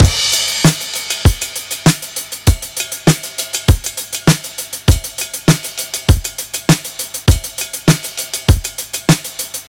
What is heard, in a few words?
99 bpm drum loop c key, Free breakbeat sample - kick tuned to the C note